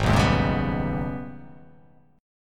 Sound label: Ab Minor Major 11th Ab; strummed; 4,2,1,1,1,2,1,x; JGuitar